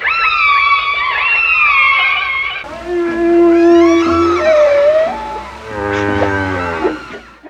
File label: Animal; FutureMusic